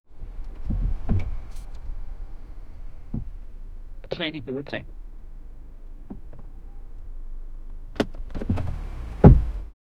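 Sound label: firm grip sound on leather; driving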